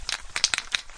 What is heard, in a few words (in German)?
found sound dosen; UNUSUAL KITS and FX; Werkstatt Found Sound Kit